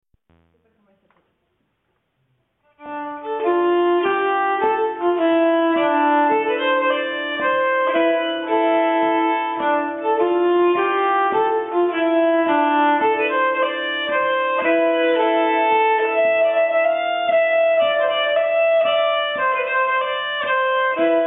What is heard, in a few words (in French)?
Melodie Suedoise Polska, nyckelharpa